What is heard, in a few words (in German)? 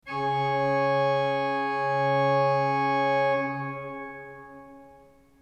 ton, orgel